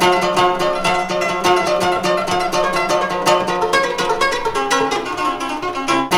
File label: KORA; Zero G - Ethnic